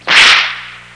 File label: Whip Crack; Curse of Dragor